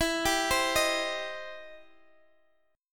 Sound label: E Minor Major 7th Sharp 5th E